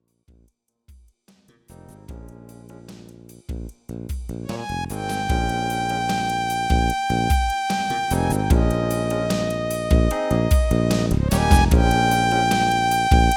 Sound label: s3 hohner ADAM